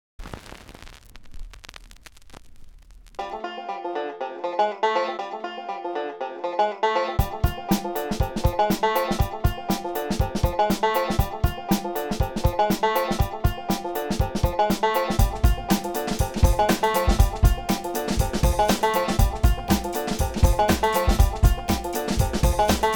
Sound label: Banjo Beats